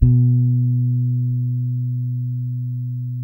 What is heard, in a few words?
Bass, FINGERED; Spectrasonics; Bass Legends